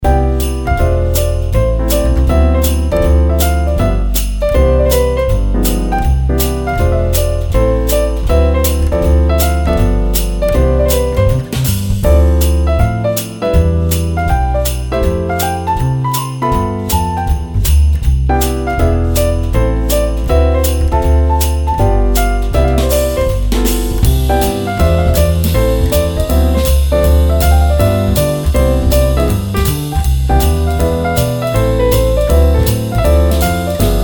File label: Deck The Halls Bells